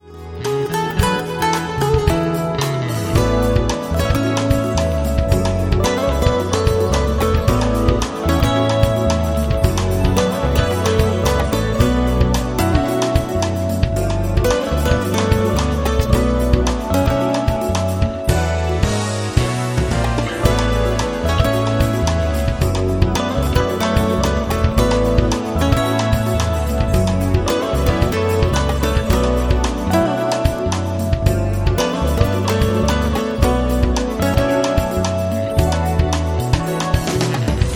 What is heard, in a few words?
jazz